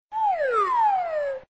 wrong answer

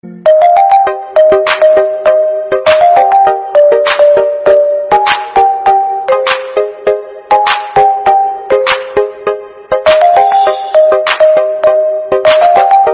Marimba

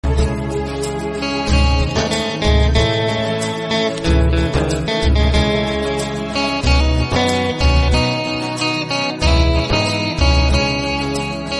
instrumental romantic hindi

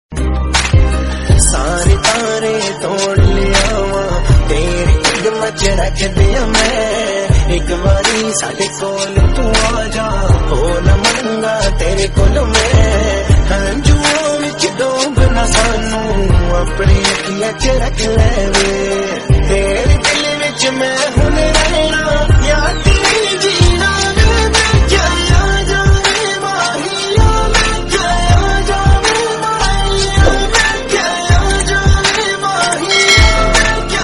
punjabi song

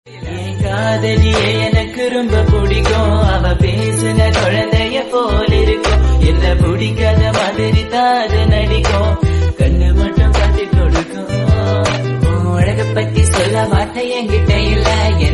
Tamil

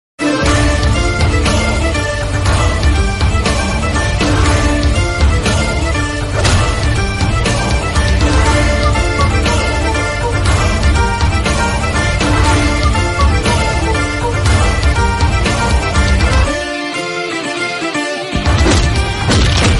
angry bgm

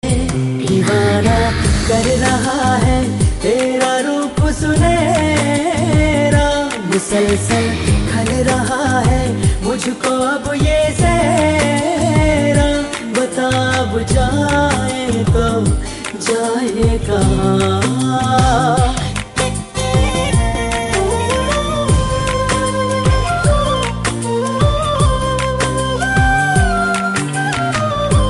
hindi song

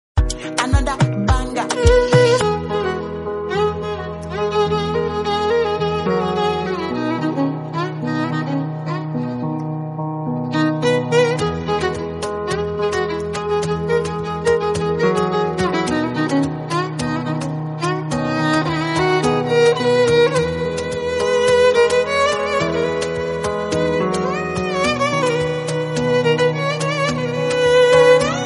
violin instrumental music

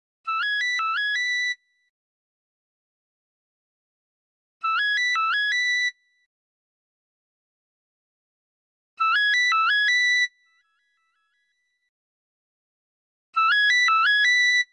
nokia original

